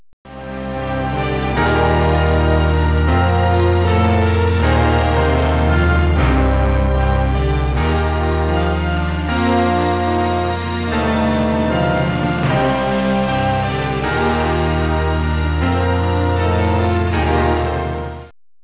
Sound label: song